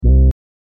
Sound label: Bass; HipHop